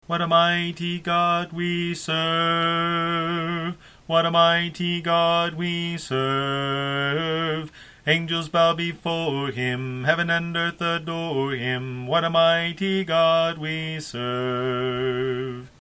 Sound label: alto